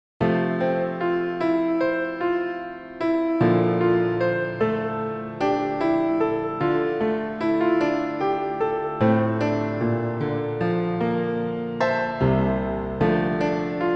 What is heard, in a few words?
piano solo